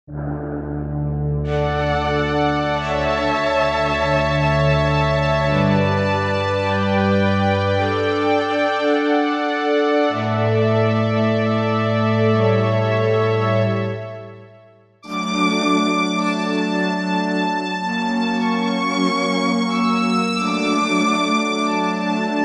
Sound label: Symphonic+, Korg MSC-1S; Korg MSC-1S Variety